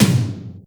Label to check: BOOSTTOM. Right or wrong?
Right